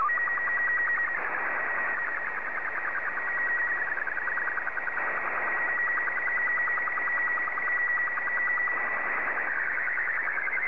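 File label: Robot 12 black and white, slow-scan television; JC7QJQ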